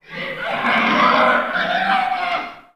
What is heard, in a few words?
enemy kill; wob2 demo